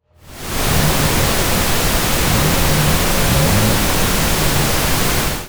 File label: Rev